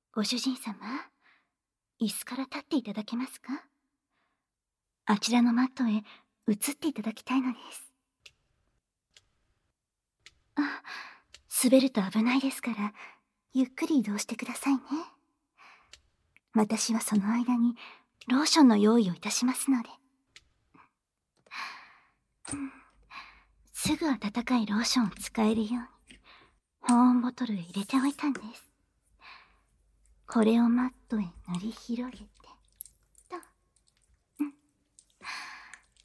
ローションマットで耳舐め; ローションマットで耳舐め手コキ♪

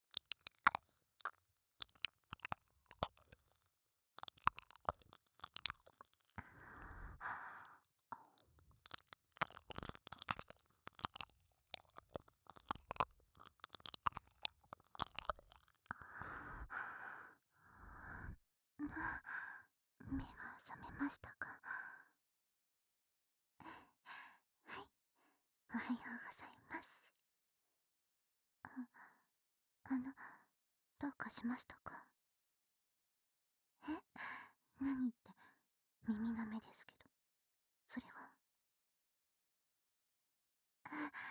SEあり 目覚まし耳舐め, ASMR

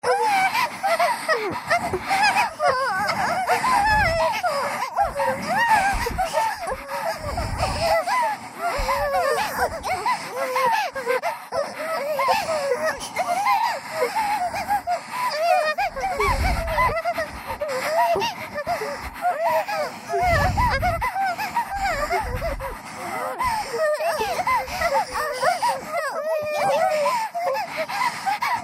喘ぎ 耳元喘ぎ; 美月＆美星のW喘ぎ＋W耳元喘ぎ

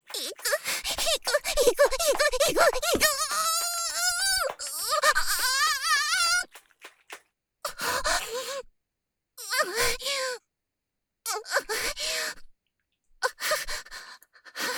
絶頂（SEあり）高潮

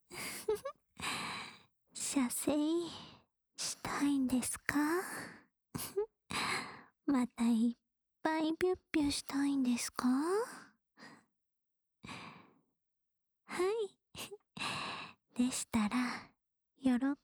耳舐め（左耳）; オナサポ用

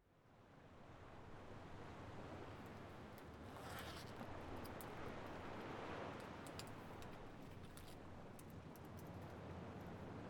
書き物作業をする＆波の音 セリフなし

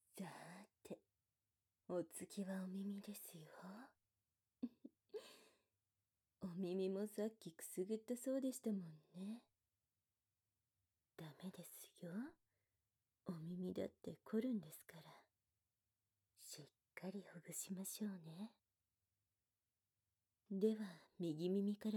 ホワイトノイズあり, 耳たぶを唇ではむはむマッサージ・誘惑ねっとり耳舐め・ぐっぽり耳奥舐め; 低音ボイスの痴女エステシャンが「普通のマッサージ」をしてくれない。; エロマッサージOK版; 射精

